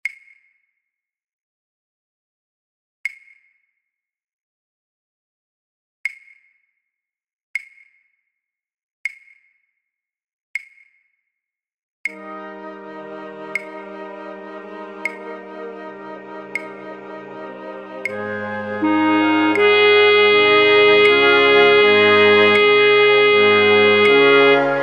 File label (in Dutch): fluitorkest, Fluit 2B1, Fluit 2B2; voor oefentracks; Matthaus Passion